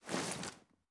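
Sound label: weapon shotgun ads up, reloads; gmod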